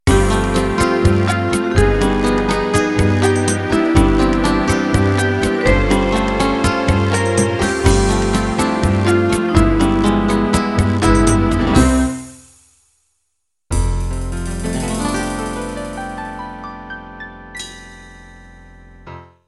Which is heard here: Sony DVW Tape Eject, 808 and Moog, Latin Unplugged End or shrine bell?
Latin Unplugged End